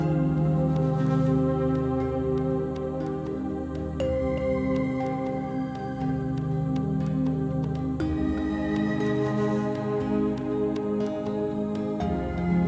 freespin ambient; Great Book Of Magic Deluxe; Wazdan

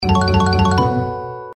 multiplier increment; Stocked Bar

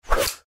missed; Ming Imperial Guards

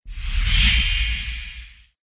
intro outro sfx; Egyptian Mythology

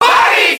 bonus chance; Party Time

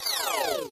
popup hide; Hot Spin Deluxe

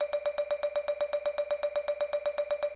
increment multiplier; games; Aztec Gold Megaways

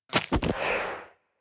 table fall; freespin end; Wild Guns

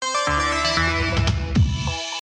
win; Party Night

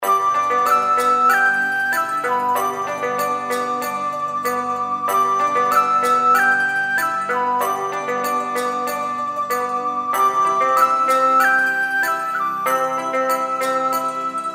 reelspin bg; Four Beauties

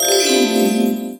bonus symbol; Royal Unicorn

